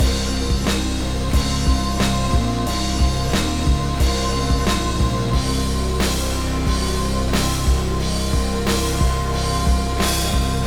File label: Hydro Active Boss Loop